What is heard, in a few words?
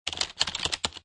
Computer Keyboard Typing Fast; Computer Keyboard Typing Fast 02: Several bursts of fast typing on a computer keyboard.; Typing Sound Effects